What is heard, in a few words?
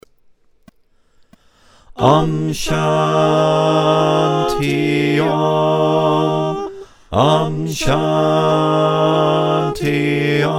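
bass, choir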